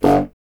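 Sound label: wind contrabassoon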